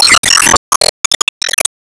Rankbonus; disney star smash android ios mobile gamerip 2020

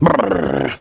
Brrr

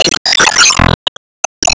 Weaverwall Activate; Disney Star Smash; Android, iOS, Mobile

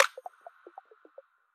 Boss Snap; Winkydink

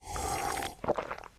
Consume Drank

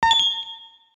Keypickup; The Binding of Isaac; Keypickup Gauntlet

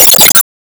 Fistball Hit; Disney Star Smash; Android, iOS, Mobile; Hades

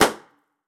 Balloon

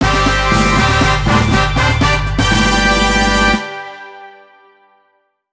Music event; Power Pro Kun Pocket R

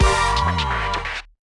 Crew Cheer; Subway Surfers City; Android, iOS; End Run Screen Crew Cheer

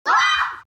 Floaty Baby Roar; The Binding of Isaac

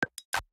Task Notification; Going Under

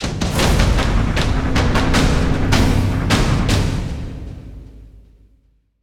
Stinger Fill

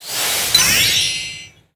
Ball Build

